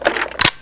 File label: pkm clip in